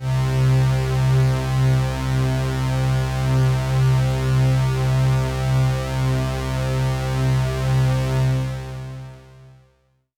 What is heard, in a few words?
Pad C, Synth Prophet One Shots; Electronisounds